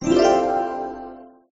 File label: power create; gardenbloom